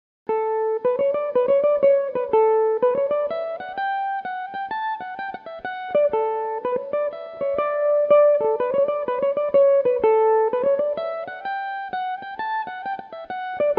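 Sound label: slide, D major, mandolin; Connie Walsh's Slide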